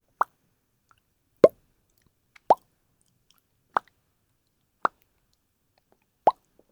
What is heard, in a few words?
Pop